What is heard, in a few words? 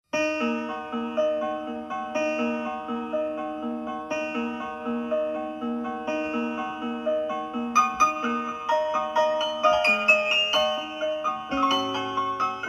inverted